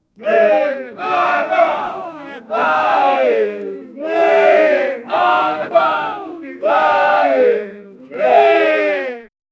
a group of adult male, viking

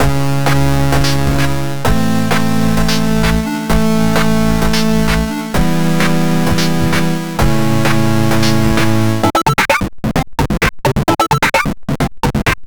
smooth lo fi 8 bit chiptune

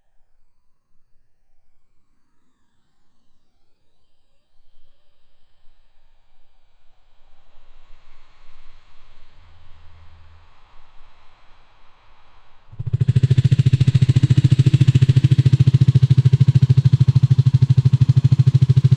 first a very cool demonic

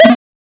a block getting picked up, puzzle

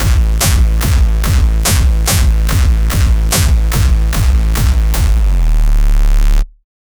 fx space with harder kick

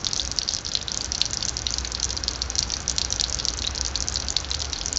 summer rain, calm

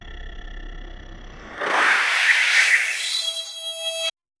pushing a button, button press

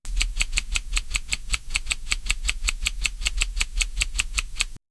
text scrolling sound

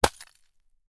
sharp icy shard impact; ice

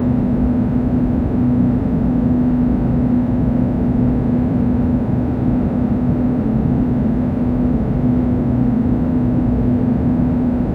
ambient sound with a quie

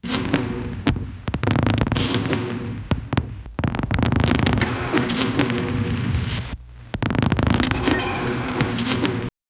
emphasize physical effect, scifi

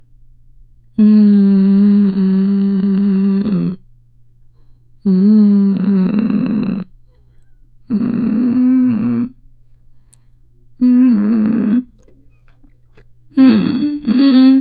woman mouth covered hmm h, expressions

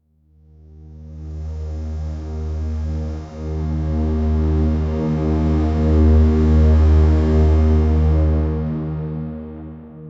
deep horn with drone

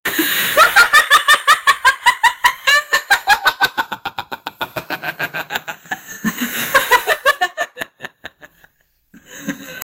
static laughing effects

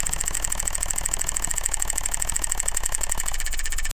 blade

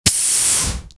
small explosion then toxi, gas

